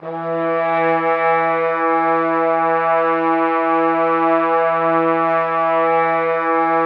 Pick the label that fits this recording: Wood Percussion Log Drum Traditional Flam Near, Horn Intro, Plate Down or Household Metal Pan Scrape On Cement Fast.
Horn Intro